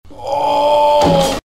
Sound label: big female orgasm